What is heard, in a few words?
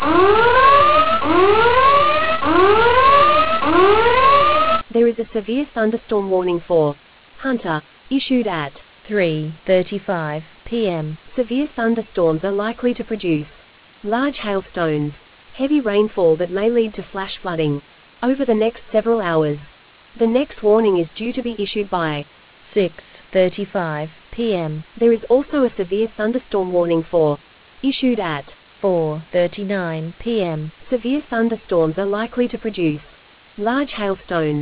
storm audio